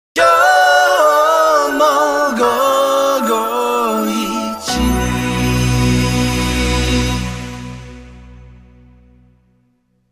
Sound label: jingle